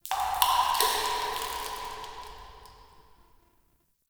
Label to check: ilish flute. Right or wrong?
Wrong